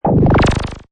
Hi Tech Panel Servo Button; High Tech Sound Effects

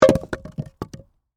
Impact Wood Plank, Drop, On, Rock, Pile; Wood (Sound Effects:Materials); BlastWave

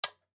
Plastic Switch On, Switch Toggle Click On; Plastic Switch Flip; Switches; Foley

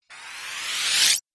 Ghost Whoosh Appear And Vanish; Ghost Sound Effects; Swish, Zoom, Swipe, Whoosh; Andrew Fleming

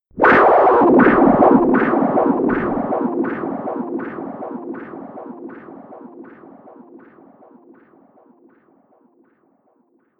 Chaotic Electronic Wind, whoosh

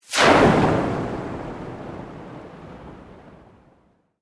Sweep Organic Medium

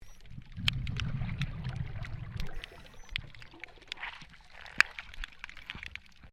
MAINTAINENCE WORK UNDERWATER DIVER BREATHING; Maintainence divers off StKilda Pier; Construction

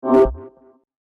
Deep And Distorted Laser

